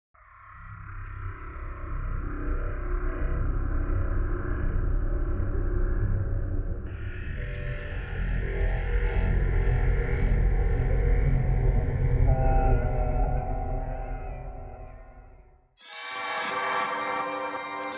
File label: Atmospheres